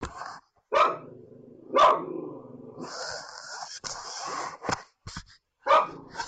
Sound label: bark